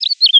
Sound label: BIRDS; tenpin